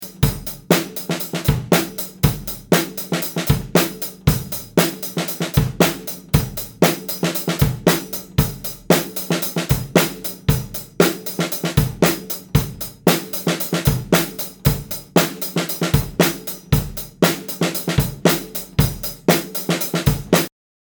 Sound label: Drums AT 4040